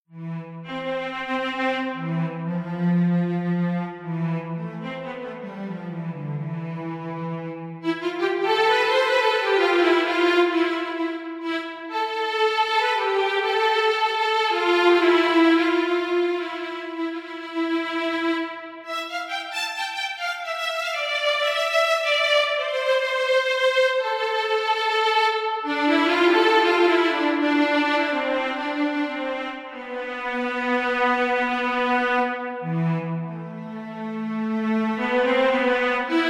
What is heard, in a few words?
impro Orchestra; Clarinet musings; on EWI-USB with Aria clarinet, look what was in my head! I had no idea until I've started to play. And I stopped out of pity for the listner